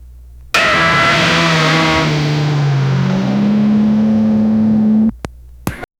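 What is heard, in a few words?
aggressive hypercar burnout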